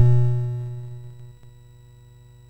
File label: sid MOS6581R2; Frantic